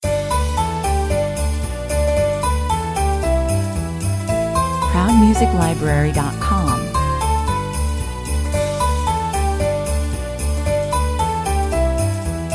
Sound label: jingle bells 113 bpm